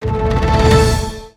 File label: Big Win Fast; casino; corgiwild